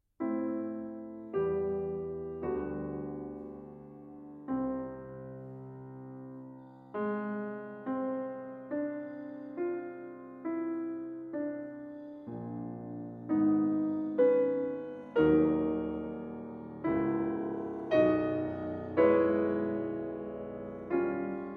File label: Piano Preludes No. 1 in A Major, Adagio Maestoso